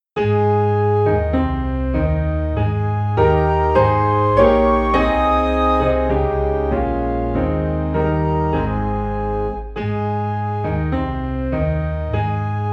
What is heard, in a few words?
Piano and Organ in A Flat; Alleluia Sing To Jesus